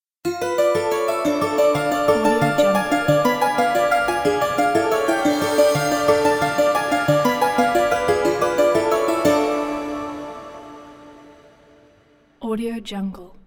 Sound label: vampire dungeon stinger